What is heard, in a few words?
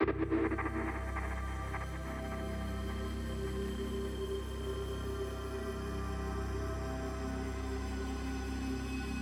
Boot; F1 2015